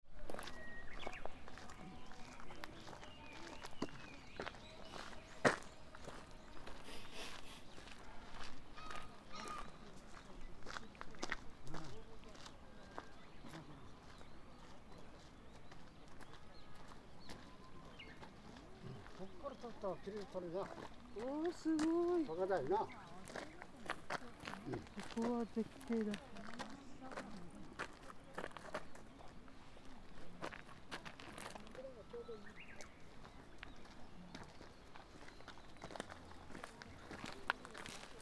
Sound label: mt hanami on the path